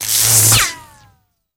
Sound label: Elect Arc Slw Rise, arcs & sparks